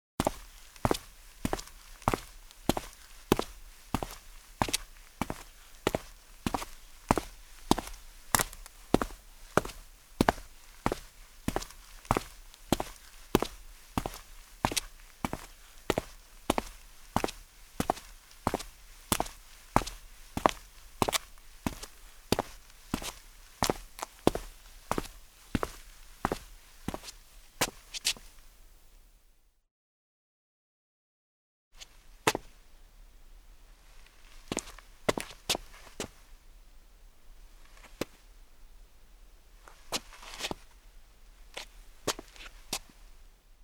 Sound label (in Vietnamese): am thanh tieng buoc chan nang ne